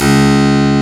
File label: HARPSICH